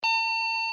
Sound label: MDC Sidetone; PTT Sounds